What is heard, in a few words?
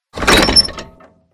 Zcorpion Reload